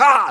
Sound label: hit, pain; mohaa